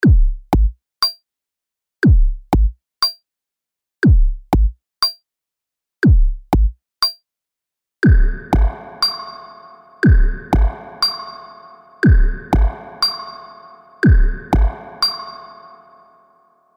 EL CAPISTAN Spring Reverb; STRYMON

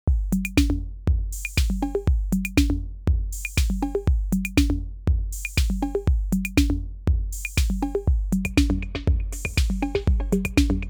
El Capistan Drumloop; STRYMON

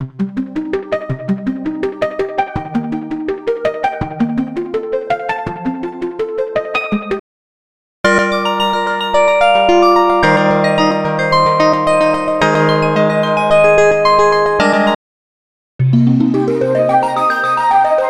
Yangtze Arpeggio Presets

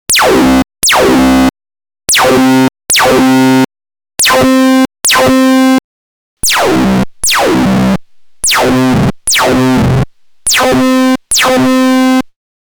Minibrute V vs Microbrute

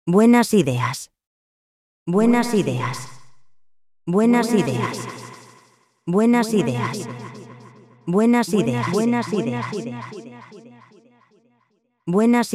DIG Vox; STRYMON

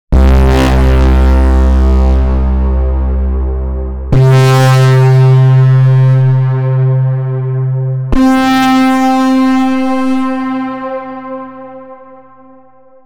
Minibrute V Preset Abyssal Horn

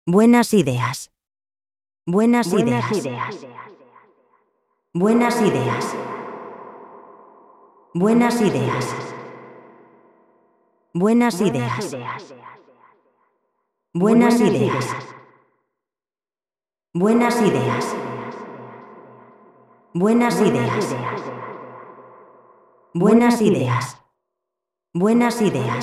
EL CAPISTAN Vox; STRYMON

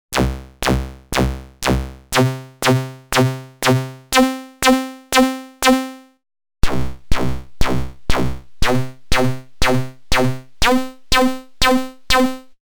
MINIBRUTE V vs MICROBRUTE

